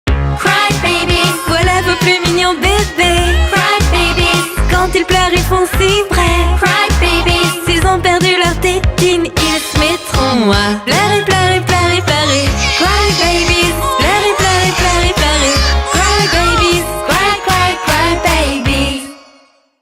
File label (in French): Publicite TV chantee Voix Off feminine; Crybabies